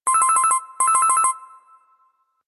Phone, ringtones

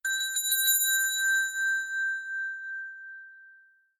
Alarm Ting-a-Ling; Samsung Z2; Ringtones

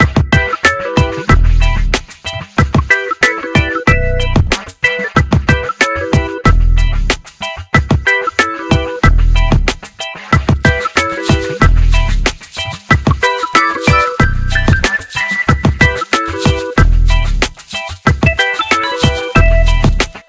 music reggae organ, ringtones; Sony Ericsson A8i

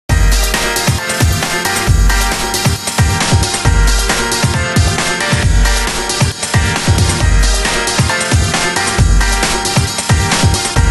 Ring Pop; HTC HD2

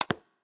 basic lock; LG X5 2018

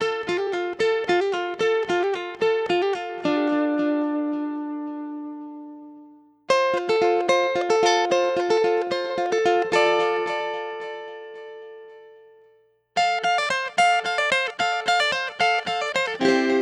Strings; Motorola Moto X Pure Edition

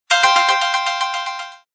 Dropping Tone; Samsung SGH-i780

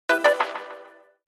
statements, notifications; HTC One-X

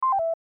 Call Fade; LG GW820 eXpo

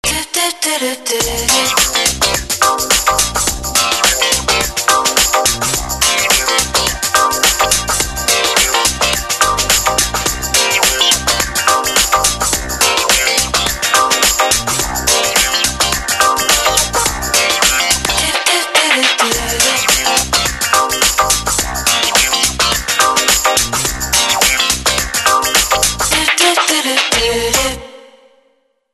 Motorola; A3100

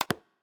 swipe lock, phonetones; LG V60 ThinQ

